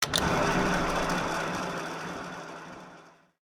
reels; Magic Idol

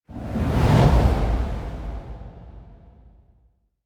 transition sand; Wild Guns

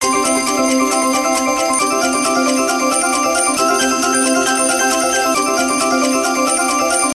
count up, slot; Hot 40

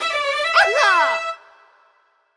gambler win; Fruit Fiesta; Wazdan

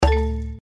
hit; Egyptian Empress

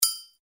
reelspin click; Royal Demeanor

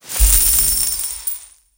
collect money; Great Book Of Magic Deluxe; Wazdan

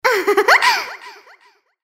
moan; Imperial Girls

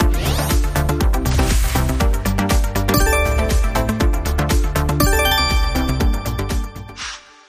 megawin; Sizzling 777; wazdan

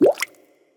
win; Hungry Shark; Wazdan

